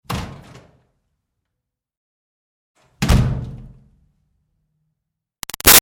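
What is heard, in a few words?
Door Wood Church Basement Room Open Close Hard; Miscellaneous Doors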